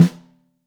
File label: SN2 Top, Snare; Ableton Foundation FreePacks; Snare Designer